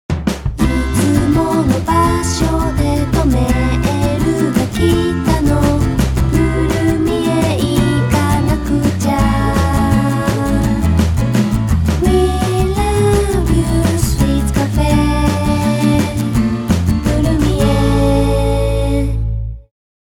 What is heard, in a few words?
song